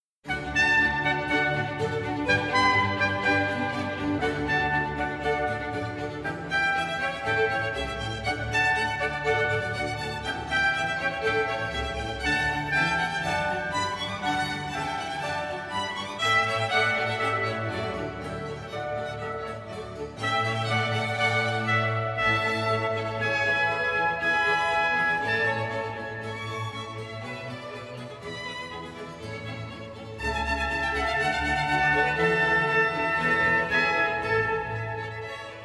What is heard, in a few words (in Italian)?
Sinfonia in Re Maggiore con trombe allegro